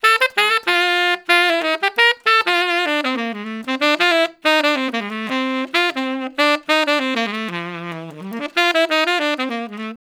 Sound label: Tenor Solo, Jazz, Traditional, Tenor Sax